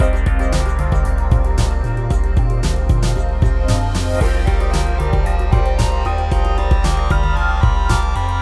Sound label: Fill